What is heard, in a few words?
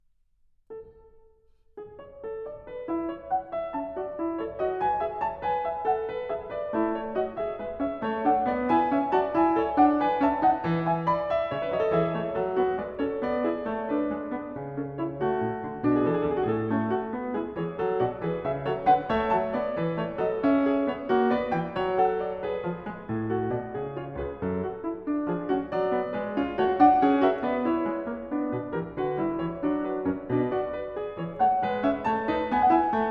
Well-Tempered Clavier, Fugue No. 19 in A major; Book 1; BWV 864; Bach